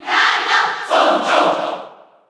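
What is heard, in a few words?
Cheer Japanese; Murabito; SSB4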